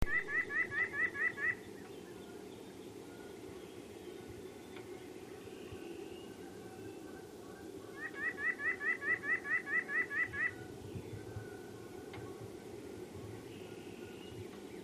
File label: nuthatch